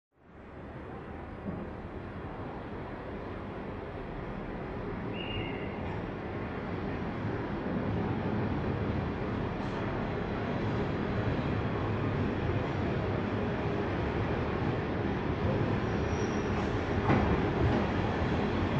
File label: Train Stn Foreign Amb, train station